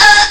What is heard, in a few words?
ahh; Amiga Soundtracker Sample Packs